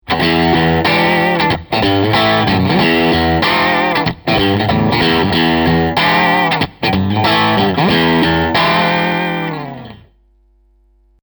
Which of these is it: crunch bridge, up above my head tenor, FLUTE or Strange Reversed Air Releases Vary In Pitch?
crunch bridge